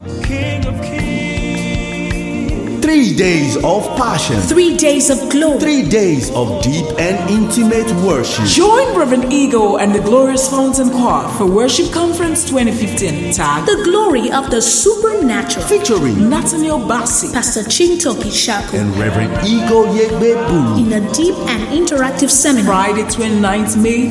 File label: Worship Conference Jingle